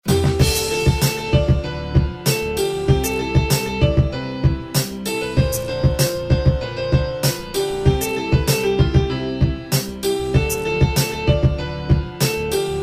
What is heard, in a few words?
Instrumental